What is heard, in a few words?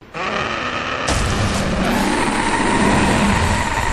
hollow; Bleach